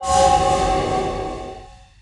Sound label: ring